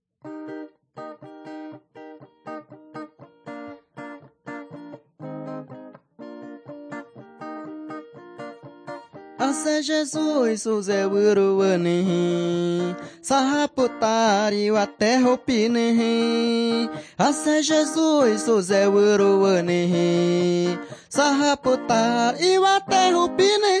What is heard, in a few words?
singing to god; guajajara; wycliffe bible translators inc nt drama